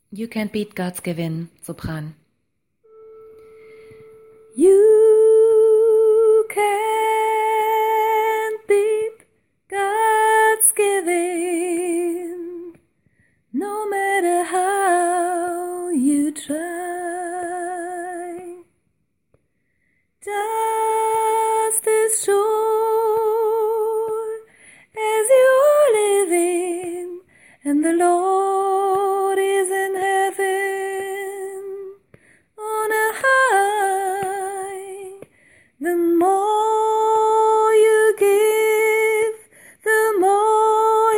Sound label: Sopran